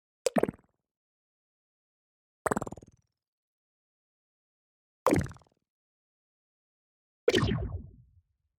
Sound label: Clay Plop Various Negative Ui; Ui click; Funky Audio